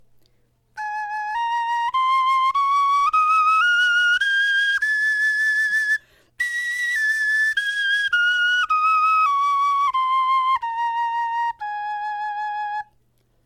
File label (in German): tonleiter, knochenfloeten